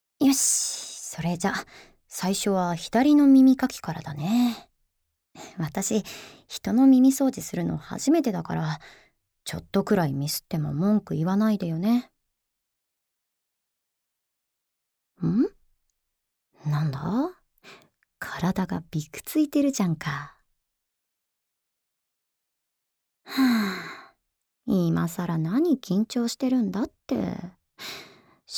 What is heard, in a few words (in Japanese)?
竹耳かきで左耳の耳掃除, ASMR